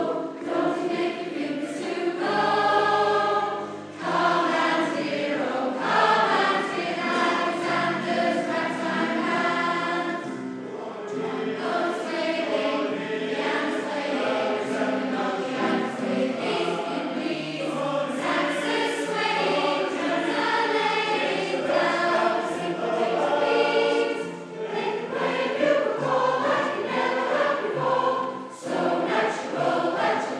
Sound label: community choir; lindley